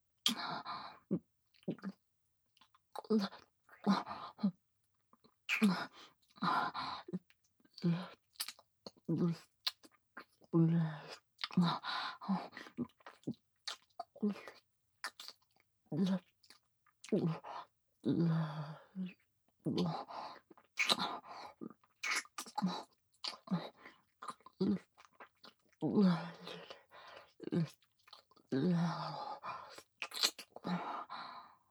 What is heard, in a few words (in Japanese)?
フェラチオ・舐め・普通, ASMR; loop音声